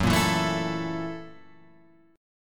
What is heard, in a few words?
Gb 7th Sharp 9th Gb, Guitar Open F; strummed; Gb7#9; 6,5,4,4,7,4; JGuitar